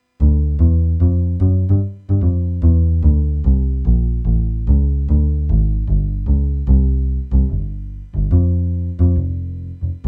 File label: bass